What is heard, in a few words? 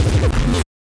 stylized glitch sound; soundscrate